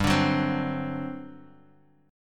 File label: G Major 7th Suspended 4th G down; strummed